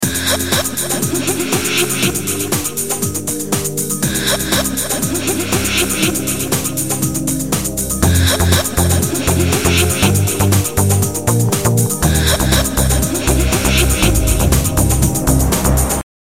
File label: House